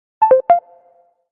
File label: message alert